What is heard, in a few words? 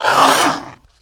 Raptor Attack